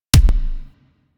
Pistol Punch Delayed; weapons; Warframe; Thanotech

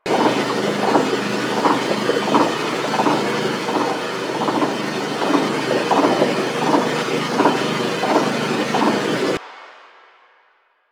Stalker Cave Water Pump Mid Loop; Ambience; Warframe

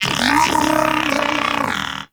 Moa Pet Tenacious Battle End; Warframe; Pets

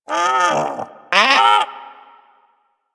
Sea Bird Vocal Idle; Warframe; Hunting Critters; Ostron Sea Bird

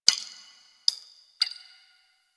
Ocean Interior Drips; Warframe; Grineer Ocean

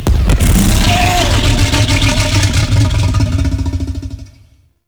Pit Monster Puke; Warframe; Infested

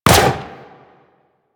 Sentient Laser Fire; Warframe; Sentients; Symbilyst; Weapons

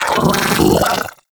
Arachnoid Vocal Attack; Warframe; Corpus; Arachnoid Hunger

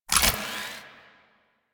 Shotgun Quick Reload Layer; Weapons; Warframe; Pax Duviricus

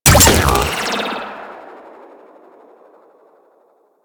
Shock Rifle Alt Shot; Warframe; Weapons; Corpus

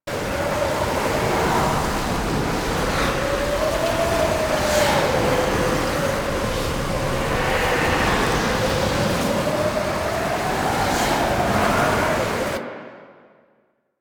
Hazard Fracture Loop Cold; Warframe; Duviri; Undercroft Hazards

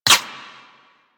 Ricochet; Warframe; Cowgirl

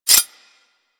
Whip Mech Rotate; Grineer

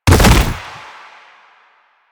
Bodyfall Light Default; Warframe; Lasrian; Lasrian Soldiers; Foley; Body Falls

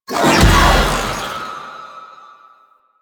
Whirlwind Cast Start; Warframe; Caliban

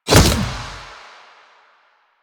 Punch; Warframe; Kuva Lich; Finishers

